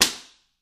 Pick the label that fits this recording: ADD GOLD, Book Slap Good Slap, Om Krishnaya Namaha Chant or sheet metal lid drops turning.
Book Slap Good Slap